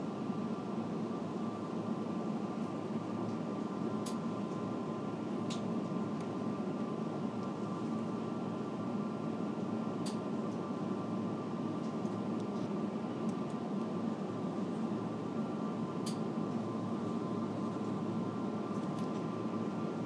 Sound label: fridge sound; at booths